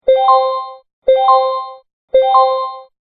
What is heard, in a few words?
increase